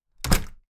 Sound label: Door Front Closing